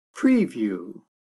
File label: brain noize loop